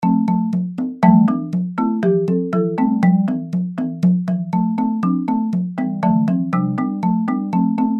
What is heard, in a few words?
music; skyfire; Android Games: Skyfire